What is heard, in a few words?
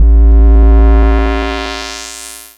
Logotype Raw Intro Outro Trailer